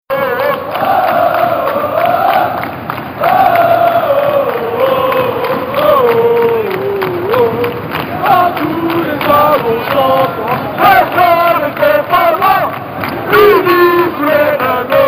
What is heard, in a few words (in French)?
Le chant des Stephanois